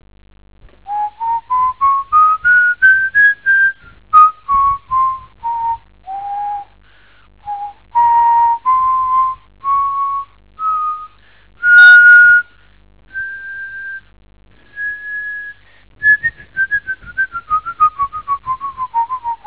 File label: Whistle